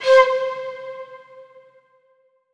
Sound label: Flute 5 - C; 99Sounds; Monosounds; Music Loops; Instrument Oneshots